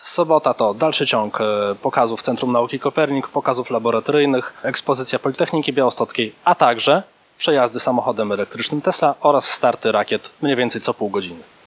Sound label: techno